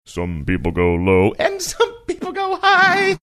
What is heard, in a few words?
singing some people go low